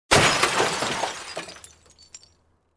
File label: Broken Glass